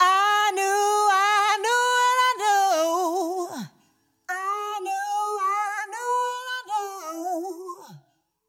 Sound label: Vocal with phaser; 人声应用移相效果; 通过LFO对全通滤波器截止频率的调制，移相效果器能够产生类似扫频的声音效果。下面的音频展示了人声应用移相效果的先后对比。